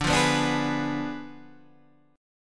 D Diminished 7th D down Guitar Standard; Ddim7 strummed; JGuitar

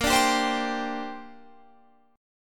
A sharp 6th Add 9th; A#6add9 strummed

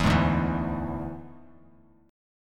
D Suspended 2nd Flat 5th D Bass Standard; strummed

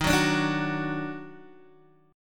Eb Minor Major 7th Eb down Guitar DADGAD, chord

